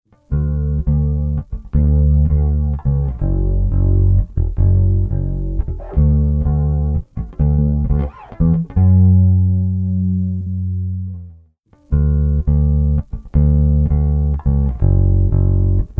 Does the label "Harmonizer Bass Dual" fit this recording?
Yes